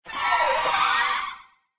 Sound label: Roar; Giggling Minister